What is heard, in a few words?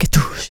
HIT, Jazz FX FEM PERC